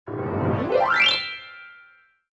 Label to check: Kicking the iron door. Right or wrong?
Wrong